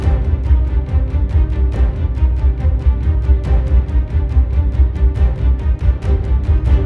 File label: multiplier Beat